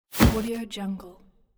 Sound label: grained sweep transition